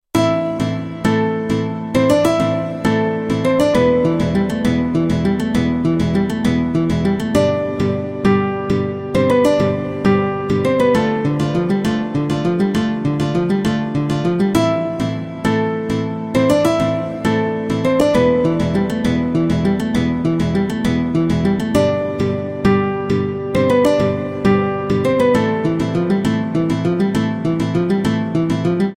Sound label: piano ringtone; game of thrones